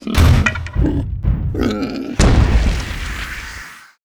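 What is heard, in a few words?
troll hunter death, monster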